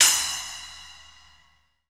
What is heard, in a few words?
SPLASH, Drum Machines, Akai MPC-3000